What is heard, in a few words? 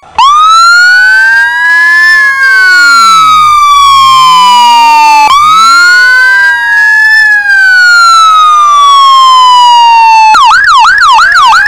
police